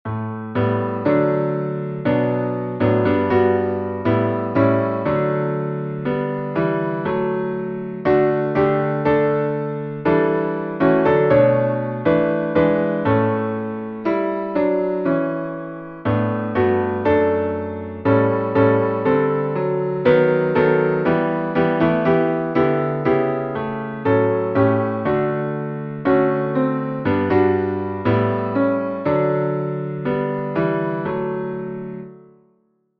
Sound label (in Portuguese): salmo instrumental